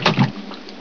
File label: slosh, player; hl2